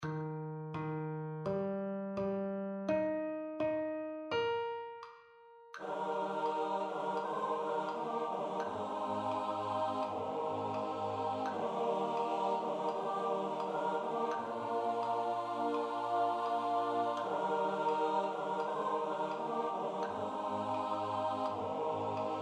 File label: SATB Study; Peace is Not What I Looked For